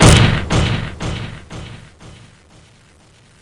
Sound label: super desk slam